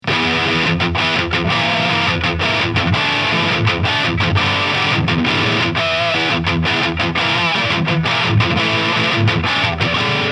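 Dean Exile 6 String Multiscale Kahler Lead, guitar; Dean Exile Select; Burled Maple SNBB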